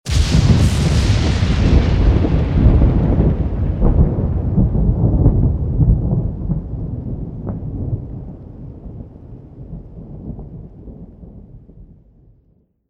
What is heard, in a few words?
Lightning Thunder 1 shot; Journey